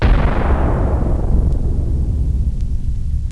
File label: MISSILE; ARCADE